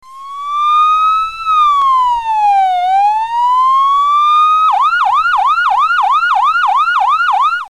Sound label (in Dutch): politie sirene; kermis geluiden